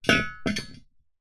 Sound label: metal heavy chunky item set down on hard floor